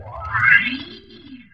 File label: ORG FX; ORGAN; E-Lab; X Static Goldmine 2; akai